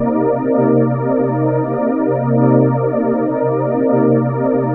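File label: soundtrack, ethereal atmospheres; Soundscan - Ethereal Atmospheres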